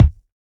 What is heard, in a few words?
sharp steel kick drum c sharp key; kick drum samples